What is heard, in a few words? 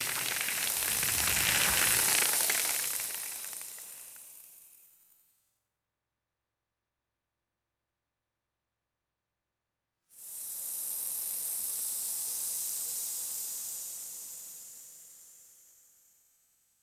light dry sand falling and scattering